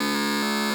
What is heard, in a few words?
Error